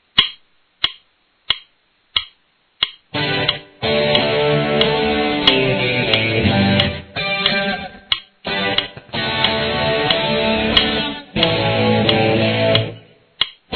Here’s a moderately fast tune at 135 beats per minute.